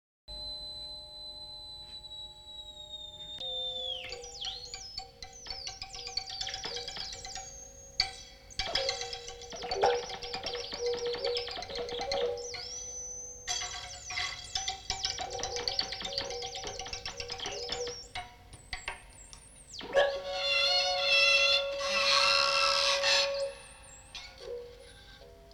Violin With Theremin; 12 Sized Violin

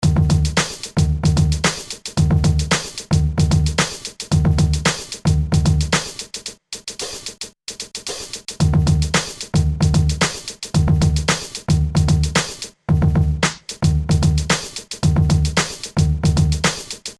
beat masher

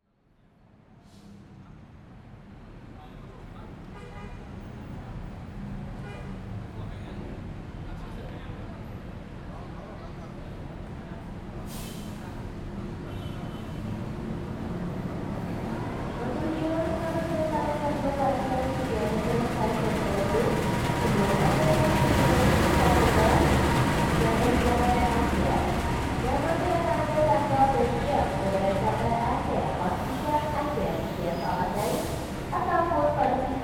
Train to Bandung; INDONESIA

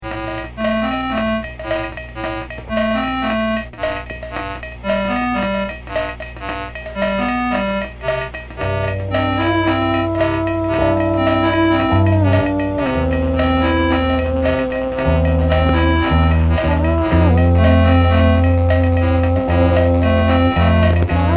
Keyboard vocals

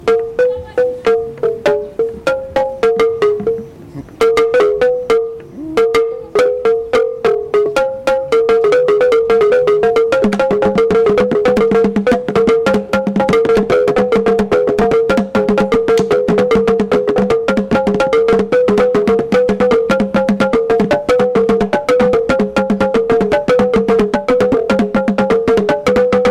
drum and balaphon; Kilembe Uganda

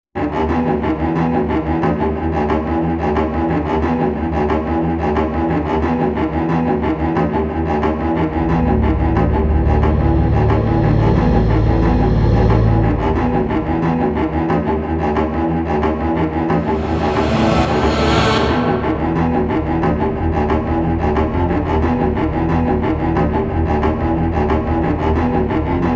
Tension Drama Building Cello; cello and more; CUES for film TV games etc

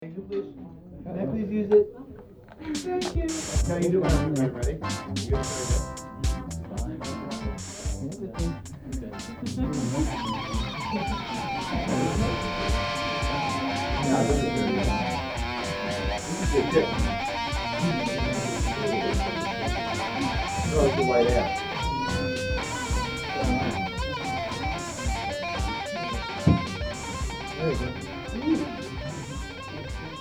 Crowd Noise Guitar Shredder

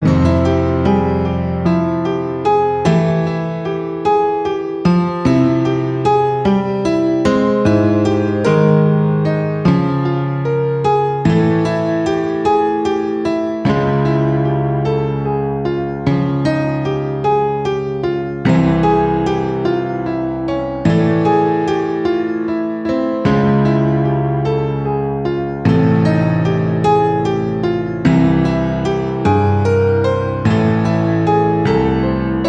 Demented piano; CUES for Film TV Games etc SOLO PIANO - or mostly piano

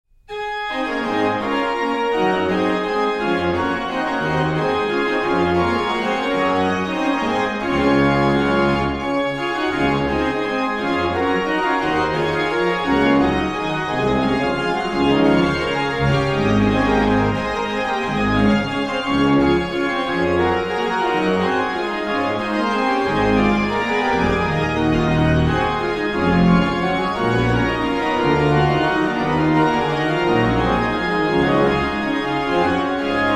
Bach Organ Works; BWV 631; Komm Gott Schpfer Heiliger Geist; Orgelbchlein

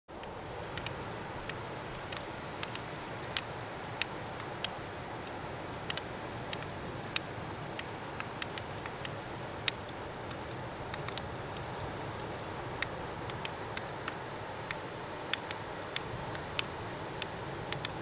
Drops; AUSTRALIA; Les Cartes Postales Sonores; NOISE ON EARTH Vol7

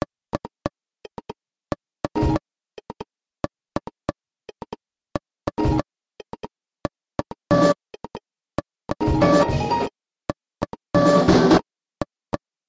Fake Beats

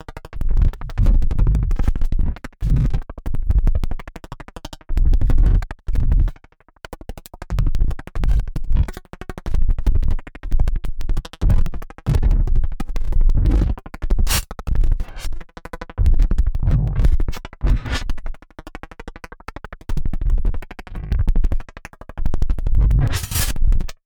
kick explorations

